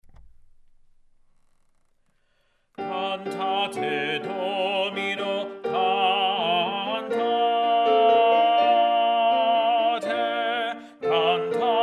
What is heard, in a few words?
Bass; Cantate Domino, G. Pitoni